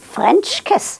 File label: kiss; FR